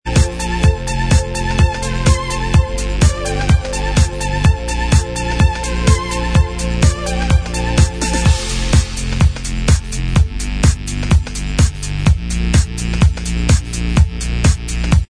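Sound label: instrumental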